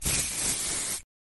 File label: spraying deodorant